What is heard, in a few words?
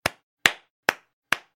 Clapping